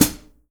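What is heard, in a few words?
HI HAT, JAZZDRUM; Ueberschall; Drum & Bass Flash Trackz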